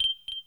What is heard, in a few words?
colide thing; wob2 demo